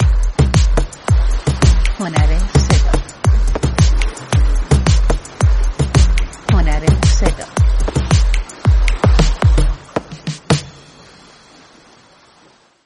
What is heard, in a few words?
persian groove